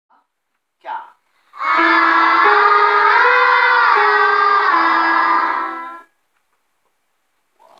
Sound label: Vocal